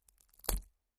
Fingers and bone being broken. Mutilation, Body Dismemberment, Gore Break, Bone